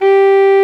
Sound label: ALTO SAX G4; Greytsounds VOL-1